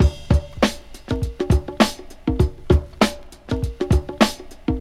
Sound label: 100 bpm modern breakbeat sample g key; drum loop